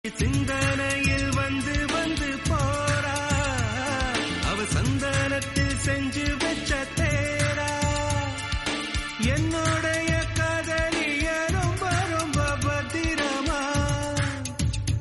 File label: song tamil